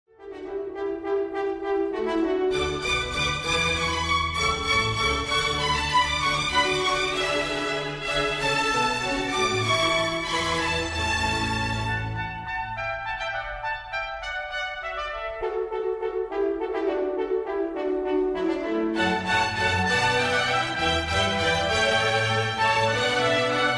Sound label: water Alla Hornpipe; Handel